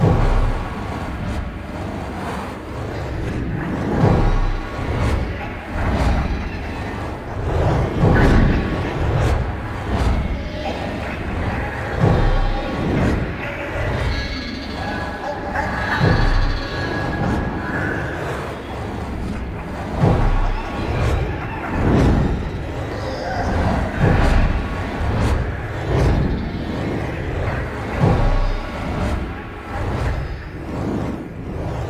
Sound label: Overheat Music; Aeon Must Die